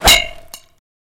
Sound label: Bullet Impacts Clan, metal